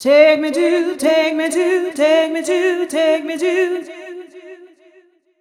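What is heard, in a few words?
female 126 mel f-sharp, Vocals; Mutekki Media - Diamond Vocals 2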